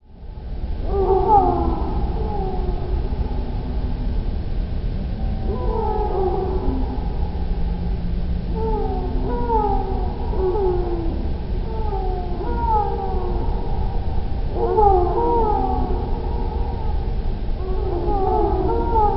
Slow Birds

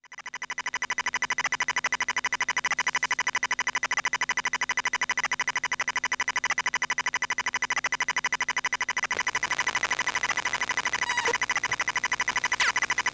iPhone 8 QI Boot, EM Pickup

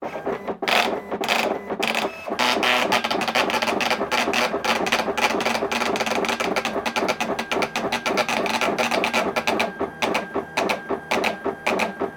Index Basic V4 Embosser printing out a Pi chart

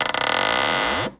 Magnet Noise

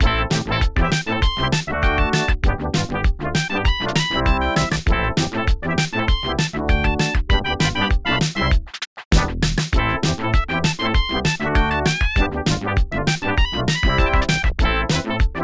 Oldskool Menu Music

